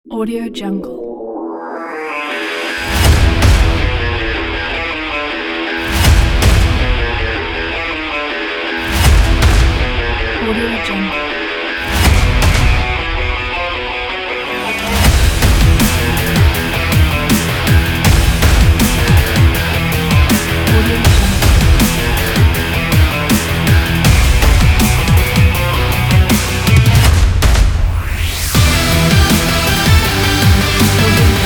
energetic sport rock

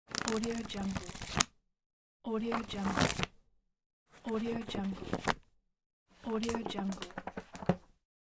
scrolling book pages

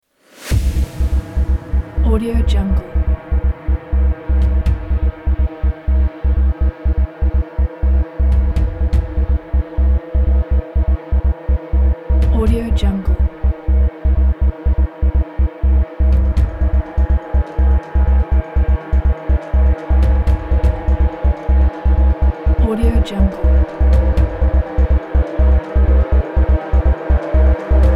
tension cinematic pulsation

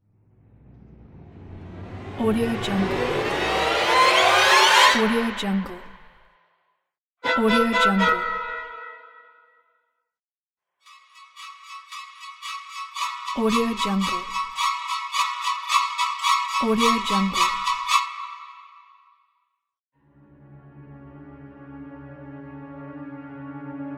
horror strings suspense

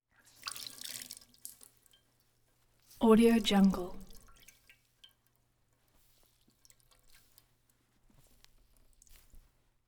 int wet towel wring; collanderson

